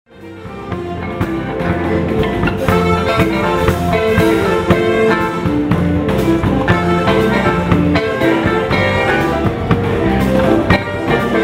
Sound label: Latin Groove